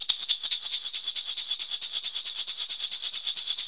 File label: MARACAS; Zero-G Ambient 1; MISC SHAKERS